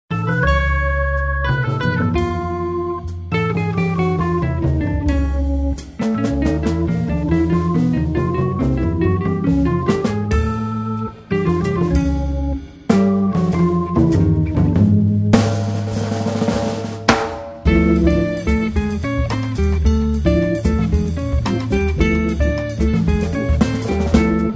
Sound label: Organ Trio